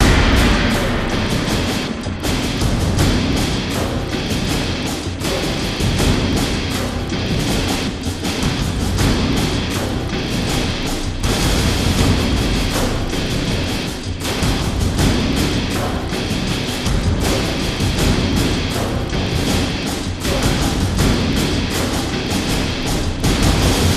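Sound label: DRUM GUITAR loop; Transformers War for Cybertron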